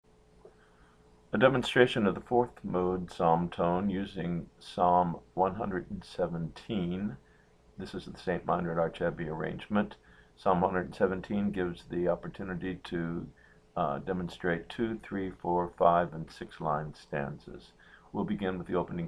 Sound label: psalm tone iv